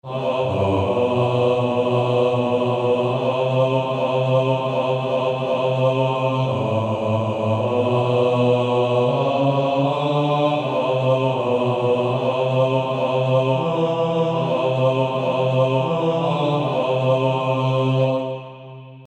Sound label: Male Choir; Jesus Calls Us